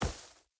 footstep burlap grass jump land; Rust

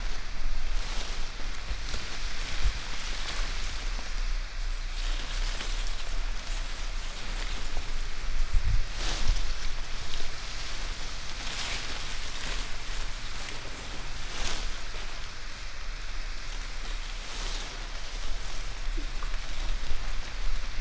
tree palm rustle; Call of Duty Modern Warfare

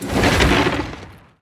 furniture slide; estate; Call of Duty Modern Warfare

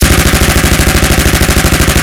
Automatic M249, Weapons; DCS

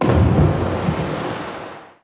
harbor occasional

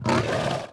Lion Fort Vox; Civ4 warlords

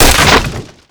impact wood 20mm; weapons; Modern Warfare

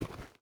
footstep rubber boots dirt walk; Rust

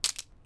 Crab Move; Civ4 warlords; Resouces and Improvements

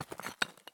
walk tile; Escape from Tarkov

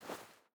footstep barefoot snow walk; Rust

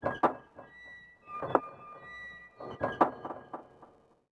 city occasional

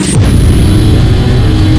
hipcutter source start

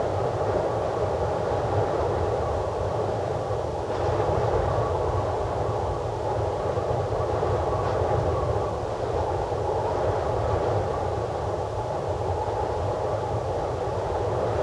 wind low

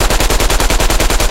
loop maxim; guns tanks; War Thunder

